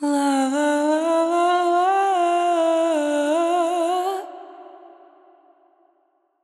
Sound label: Lalala, Vocals FX; GhostHack